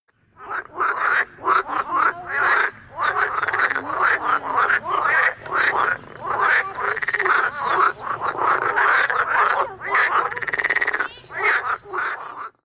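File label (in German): quakende Frösche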